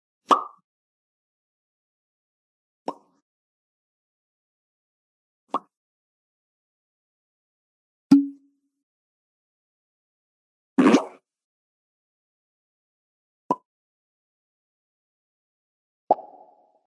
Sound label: pop sound effects